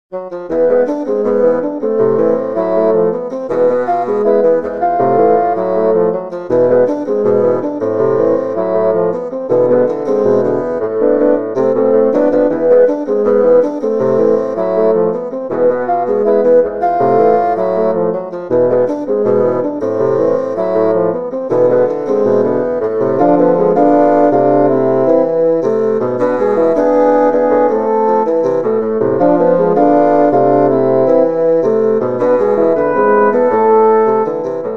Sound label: bass salsa